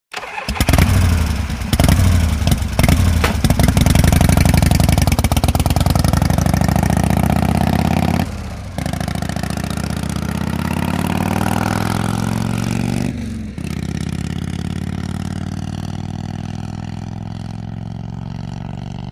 Start Away Harley Davidson

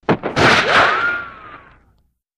Ricochet Shot, bullet bys & riccos

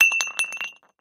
Glass Shot Glass Drop, kitchenware; No Break

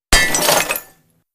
Glass Impacts Debris

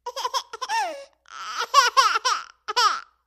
Baby: 10-month-old, Crying. Interior.; Mono

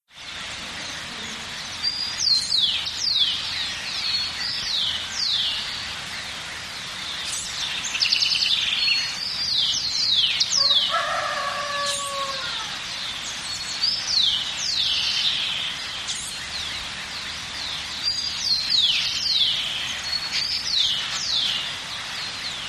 Country Song Bird

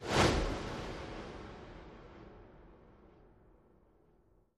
Baseball Bat Swing W; baseball fx & games

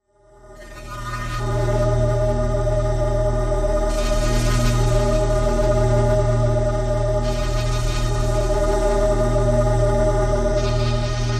Choirish Drone Airy, sci-fi ambience

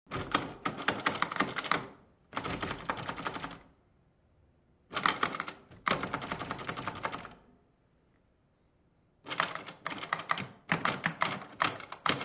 Doorknob Turn Rattle

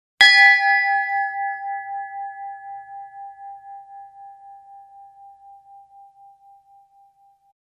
Church Bell Single, bell towers

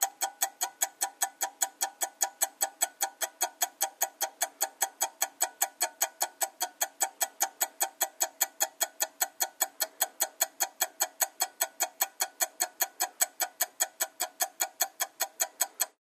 Clock Tick Tack, ticks

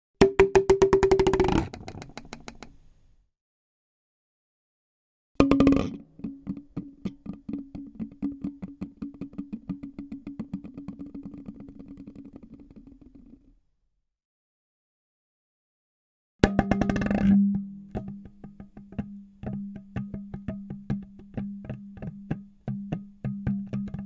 Bottle Plastic Drops

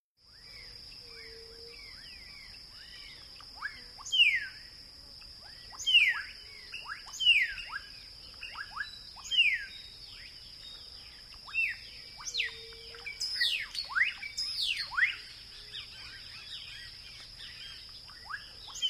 Belize Jungle Tanage

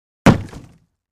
Large Object Hit Sled

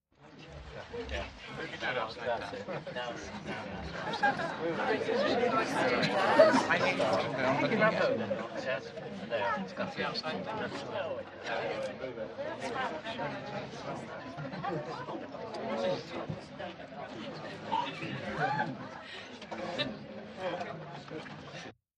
Party Chatter Light

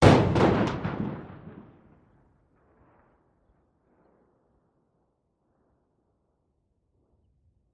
4 Oz Concussion Hit M

